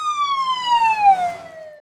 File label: alarm; Ueberschall; Techno Trance Essentials